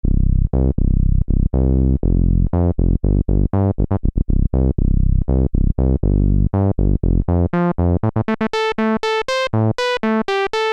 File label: novation nova desktop filter demo lpf12